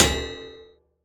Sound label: ExoHit